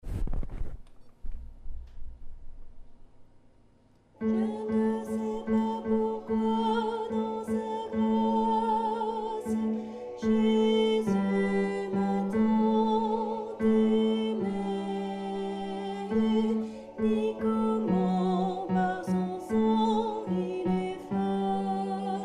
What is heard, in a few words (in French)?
tenor